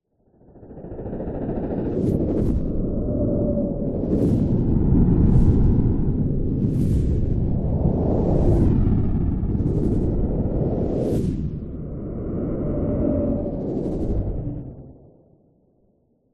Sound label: science fiction spacecraft squadron fly past